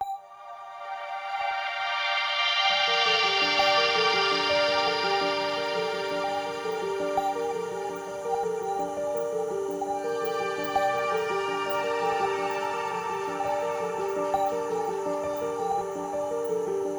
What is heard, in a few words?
Swell Pads; BB 2HTC Samples Vol 4